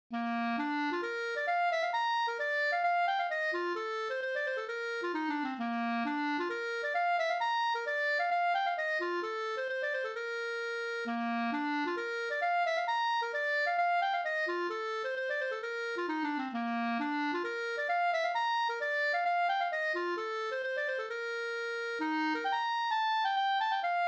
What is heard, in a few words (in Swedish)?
hambopolska